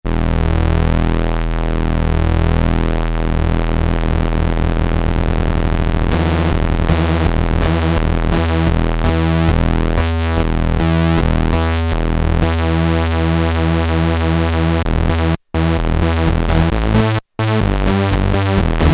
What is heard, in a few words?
The secret of PWM, Korg PolySix; Tweaks